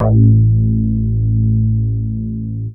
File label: moog A; Techno Trance Essentials